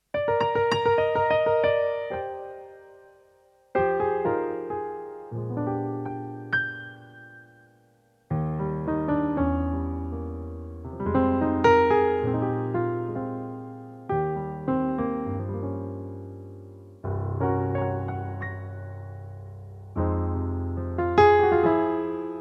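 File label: Eb piano, male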